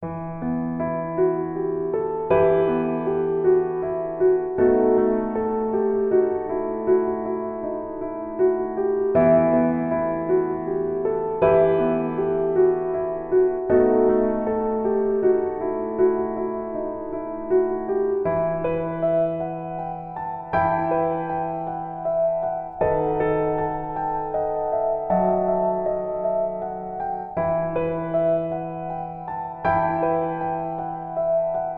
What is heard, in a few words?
Remorse Loopeable; Sable's Grimoire